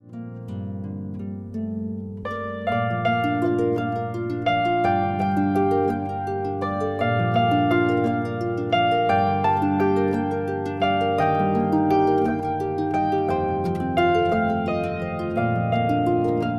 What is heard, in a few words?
Hallelujah; Harfe & Hackbrett